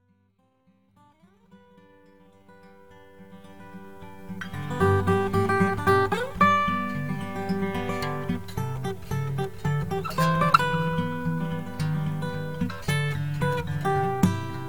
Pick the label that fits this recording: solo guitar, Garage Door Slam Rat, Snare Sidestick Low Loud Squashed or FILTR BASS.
solo guitar